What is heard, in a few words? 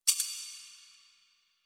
sword click; soundscrate